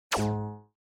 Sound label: TV Turn Off